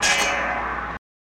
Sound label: Sawmill Blade Ringi